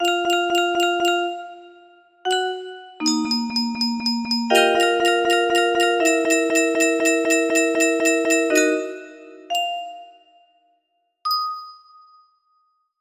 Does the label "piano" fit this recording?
Yes